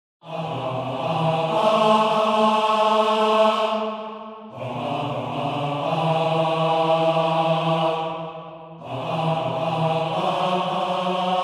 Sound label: Baritone